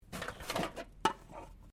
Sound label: Can Softdrink Rubbish Move; various metal cans; Materials